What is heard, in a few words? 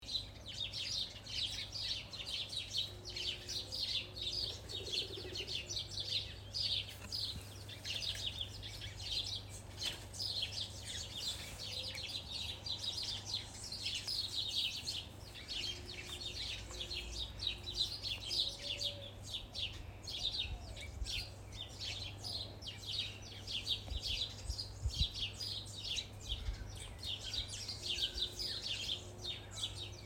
house sparrow